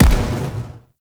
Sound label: Energy Rifle Split Explosion